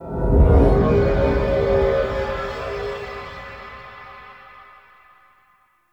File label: Portal Stinger; Gameplay; Warframe; Chimera Prologue